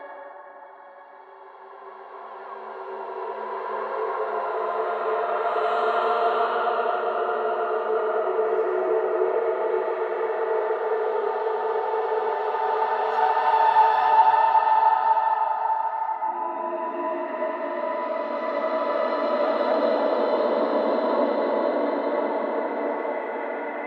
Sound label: Angel Song Loop; Warframe; NineteenNinetyNine; Stage11FuturePast; Zariman